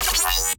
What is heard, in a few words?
Bass Shot